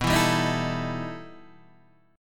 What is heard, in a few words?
E Minor Major 11th B, guitar